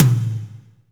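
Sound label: tom, real mega drums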